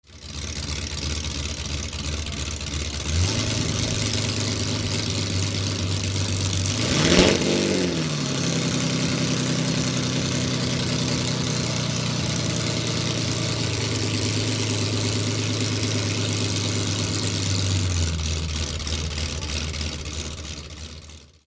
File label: Ferrari 340 America, engine